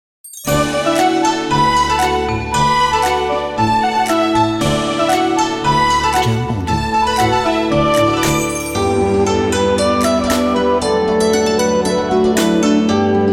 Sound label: Asian Chinese New Year